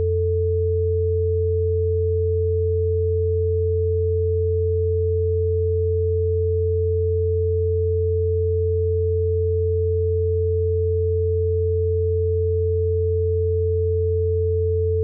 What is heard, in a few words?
stereo test